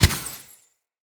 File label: Elemental Radiant Arrow Explosion, Bows; Weapons